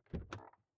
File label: car door open interior hummer h3